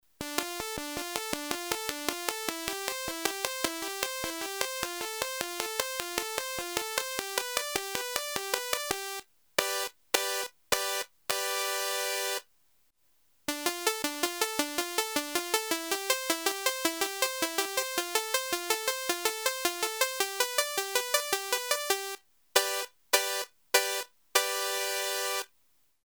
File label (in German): Filterknacks FX